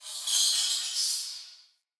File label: Cave Ocean Creature Far